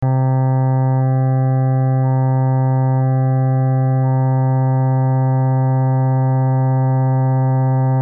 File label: cello